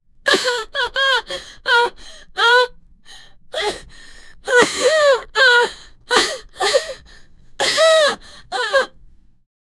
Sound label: a woman violently barfing, vomit